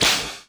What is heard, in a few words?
WHIPN GATE